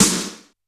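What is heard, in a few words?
G1 Snare Drum Rock, Yamaha PSR-E323; Analog Kit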